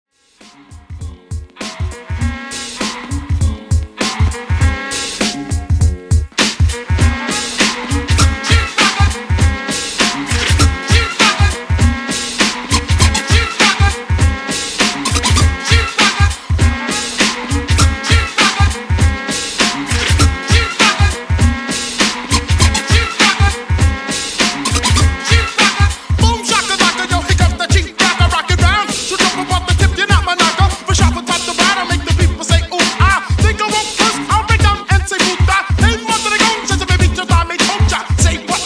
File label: Old School Redrum 100Bpm